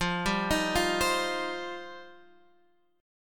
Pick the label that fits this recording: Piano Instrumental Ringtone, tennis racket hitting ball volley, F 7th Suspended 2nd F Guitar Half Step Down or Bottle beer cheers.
F 7th Suspended 2nd F Guitar Half Step Down